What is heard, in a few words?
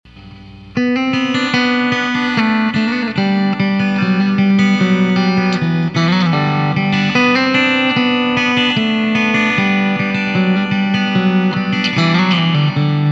jangle clean; Cardinal